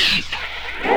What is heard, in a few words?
TECKNO; AMG - Vince Clarke Lucky Bastard